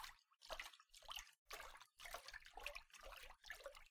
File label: fast swim